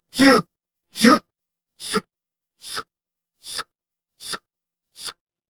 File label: fire electric zap